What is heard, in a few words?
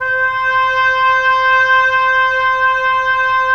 Oboe; Roland - LA Composer vol. 4